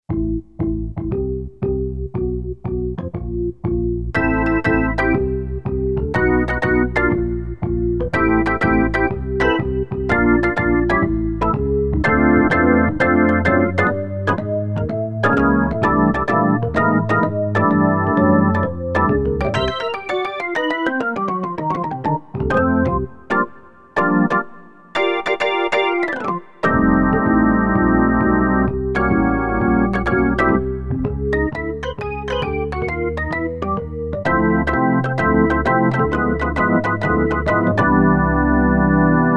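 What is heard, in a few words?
organ vintage B3; E-mu Proteus FX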